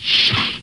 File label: door close; RobotRescue; music